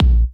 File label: Kicks Electro